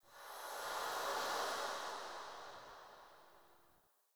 Fey Eldveil Latent Random, Powers

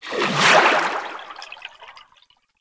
movement transition; Warframe; Vehicles; Sharkwing

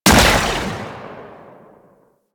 Railgun Fire; Weapons; Warframe; Archwing

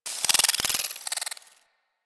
Tech Movement, Ambience; Warframe; Corpus Railjack

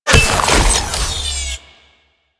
Moa Self Destruct Start; Warframe; Quests; The New War; Veso

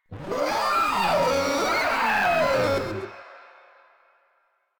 Proto Infested Runner Vocal Attack; Warframe

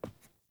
Grunt Boot Walk Wood; Characters; Enemy; Melee Grunt; Footsteps; Boots; Sinecure

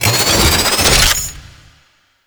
Fortress Gun Turret Deploy; Ambience; Warframe; Grineer Fortress

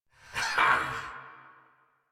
Attack Effort; Warframe; Dax Knight; en

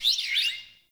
Close Bird; Ambience

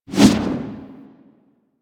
Movement Whoosh Low

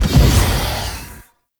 Wraith Leap Jump; Enemies; Warframe; Zariman

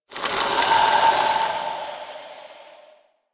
Infested Pistol; Warframe

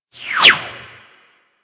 Arch Skiff Bullet By; Grineer

